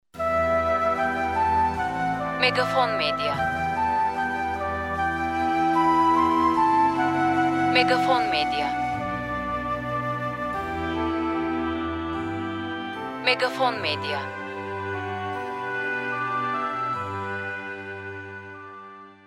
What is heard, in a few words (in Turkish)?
SANTRAL MÜZİK